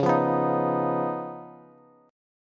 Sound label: Db 9th Db down Bass; JGuitar